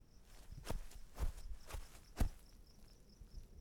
Stepping On Grass

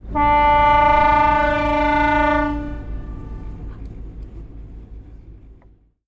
On Boat Just Horn

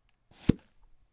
Thwop

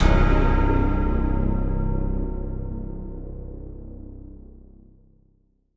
Horror Sting; Apollyon

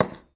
Lay Down Object

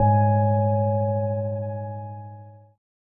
Moog Gong Bb

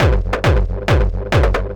Tech loop

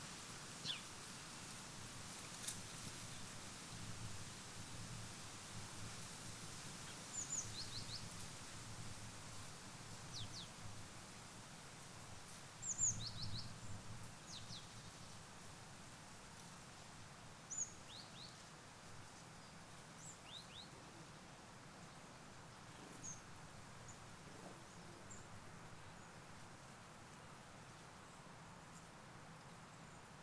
Windy Day With Birds And Trees Rustling

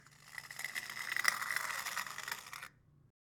Cement Scrape Quick

